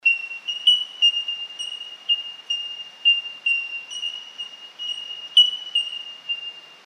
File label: Bell Bird; ringtones